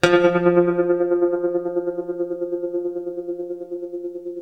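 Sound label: tremolo F 2, guitar; East West; Steve Stevens Guitar Samples Collection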